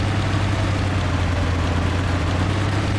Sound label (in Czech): auto zatoci; Mafie 1